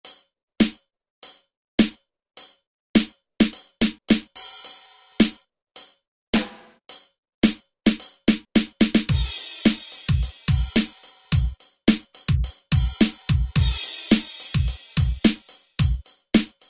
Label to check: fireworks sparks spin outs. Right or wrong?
Wrong